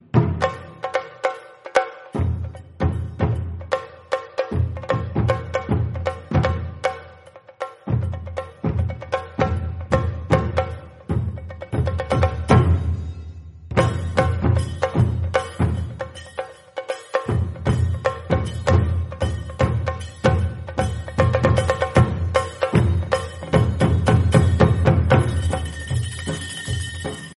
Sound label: japanese drums ringtones